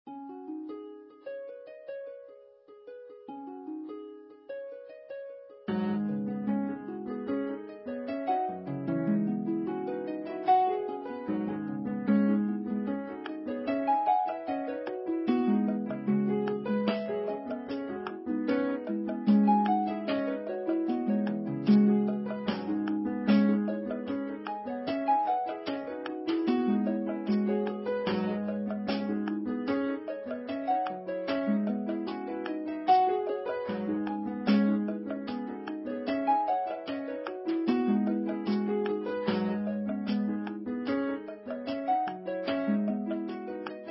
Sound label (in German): Harfe A4